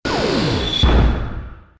failed; itgmania; stepmania

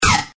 battery lose; itgmania; LifeMeterBattery

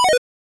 switch down; itgmania

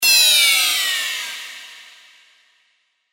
failed; itgmania; stepmania

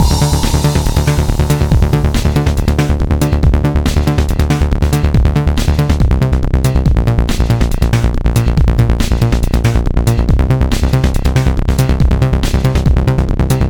music loop; itgmania; ScreenWithMenuElements

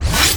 Screen cancel; itgmania

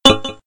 marker; itgmania; Themes default

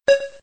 value increase; itgmania; Themes default

